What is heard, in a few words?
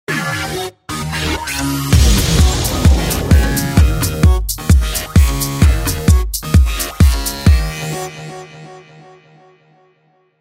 Time bumper